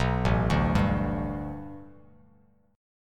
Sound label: A 7th Suspended 2nd Suspended 4th Bass Standard; A7sus2sus4 arpeggiated